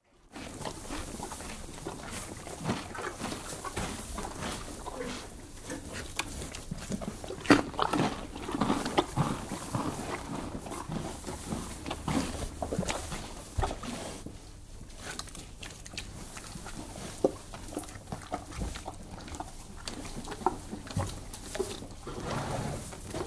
One horse eating carrot & moving around in stable, straw on floor; Horses Stables; BBC